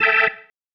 Stab, Organ, One Shots

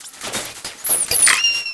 MAGICAL SEQ; Big Beat Samples & More; AKAI; ESO SEQS KIT

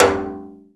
rim Timbales; E-MU Producer Series Vol. 2 – More Studio Essentials; Composer; Timbales Xswitch

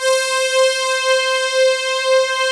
OBX PADS; Pro-Rec - Synthsonic; Z1 PADS

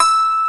YANG-CH'IN; AKAI S6000 CD-ROM - Volume 1

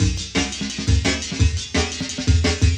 Flange FX, Loops, breaks; M8

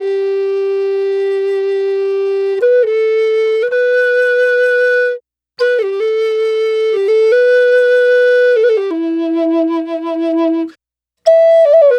Native Flute, Native American

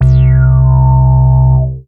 C3, BASS; Q Up Arts; Dream Experience; BASS BANK 6

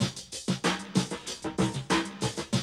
Classic DrumLoops

